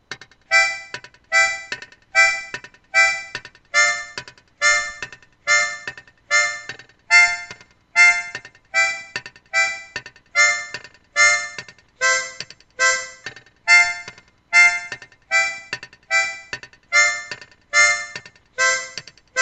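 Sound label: Rhythm complete, harmonica; reggae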